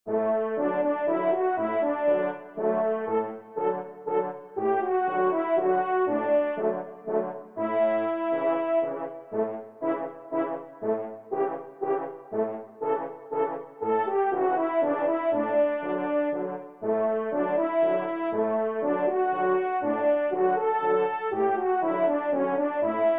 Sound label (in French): Valse des chasseurs, trompe cors; Y.Barraquand